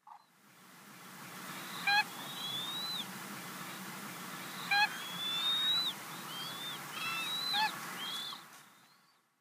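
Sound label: Siberian Crane, izumi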